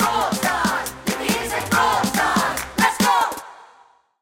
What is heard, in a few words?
loading; monster university; Brawl Stars